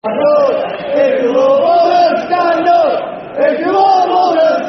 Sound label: Football Chant (Soccer Songs); Stand Up If You Love Oldham; Oldham Athletic Oafc